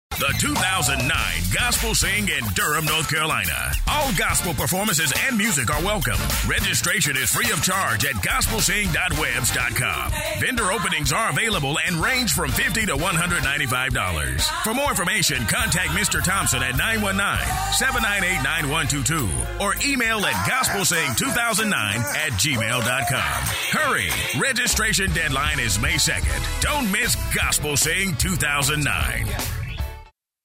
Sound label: Gospel Sing